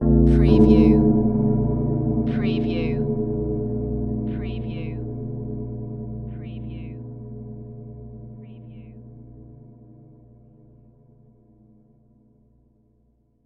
Hits Bell

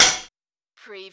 Button Sound - Metal Plate Ding

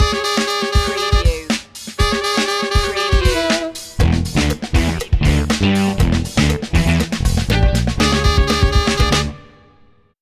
funky feet stinger

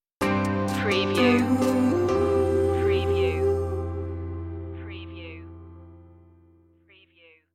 keep love around stinger

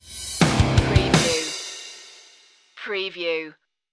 stinger

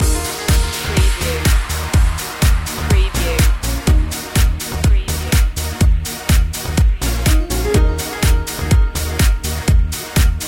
ibiza feeling loop

